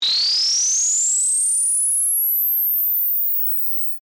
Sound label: Laser Cannon Charge, Ascend, High, Frequency; BlastWave